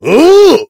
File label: sandwich eat